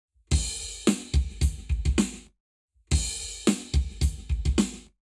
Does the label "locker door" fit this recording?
No